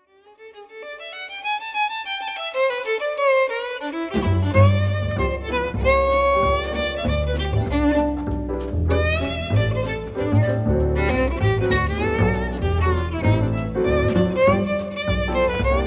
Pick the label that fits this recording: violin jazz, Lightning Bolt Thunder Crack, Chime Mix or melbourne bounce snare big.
violin jazz